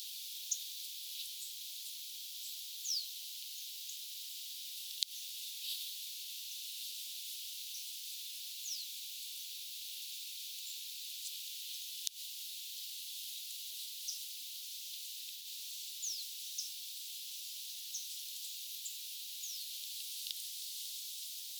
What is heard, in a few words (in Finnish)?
siina on ilmeisesti oranssisirkku keltasirkkuparvessa pajusirkun aania